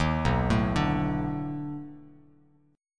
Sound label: B 7th Sharp 9th, down, Bass Standard; arpeggiated